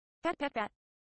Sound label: Headpat